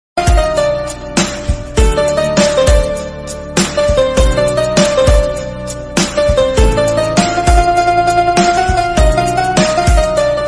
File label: Instrumental Song Ringtone